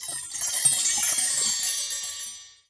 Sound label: Twisted Chimes